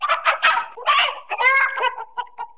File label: chicken; zones; agri